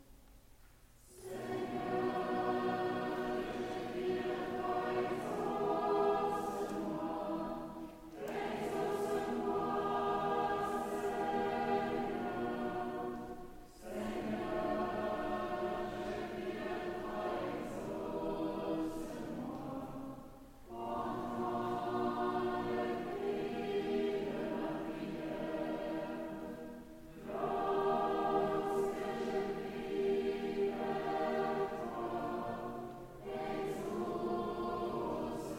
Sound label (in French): Hymne de l'Encens Polyphonie